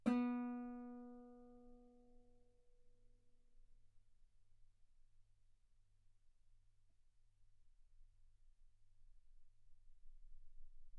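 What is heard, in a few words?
Guitar ff B string B3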